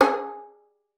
FING G#4, VIOLIN; Xsample VOL-5

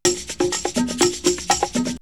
Drumloop; ZERO G - Datafile DISC 1

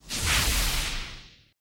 MED MOV, SWISHSWO; Total Control - Action Cutz

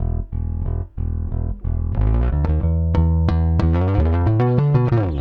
Rock, Bass; Bass X

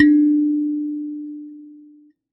Finger, Prepared Kit; Battery 3 Sample Kits

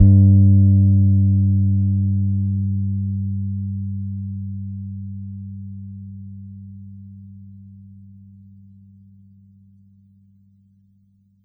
Ds G3 soft, Bass, Pedulla Thunderbass; Giga Samples Collection

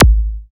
BD, Synth; Sound & Vision - Gigapack II CD 2

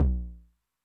Low Tune Med Decay, 909 LOW TOM; classic machines; LT - LOW TOM

